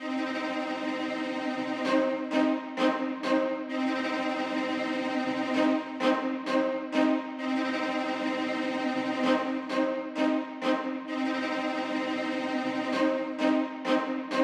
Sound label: Orchestra Cello; McRoMusic; Summoning Circle